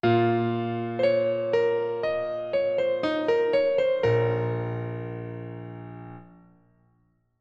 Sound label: Stingers Gas; The Dwarf Run